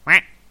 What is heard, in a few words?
quack